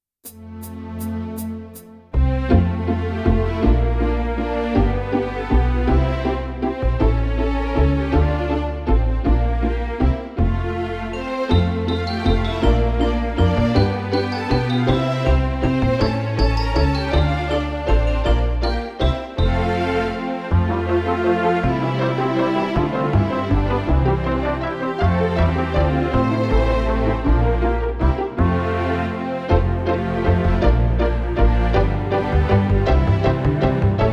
Christmas Carol We Wish You a Merry Christmas Instrumental Version Without backing vocals